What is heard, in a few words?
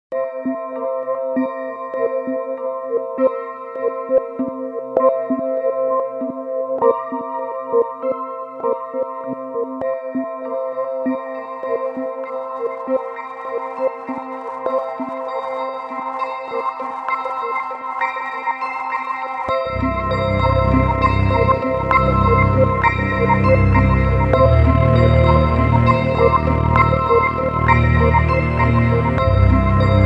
bells, digital ambient designs